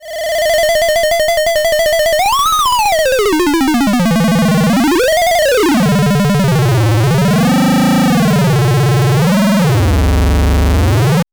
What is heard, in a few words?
Chiptunes; Body Synths Metal Fetishist